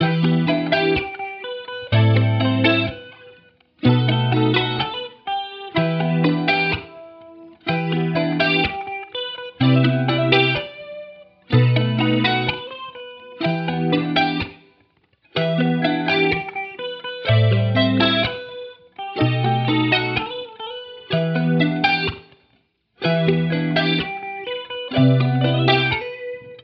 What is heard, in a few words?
125 BPM E Min, Guitar Loops; Lust; Cymatics; Journey Guitar Loops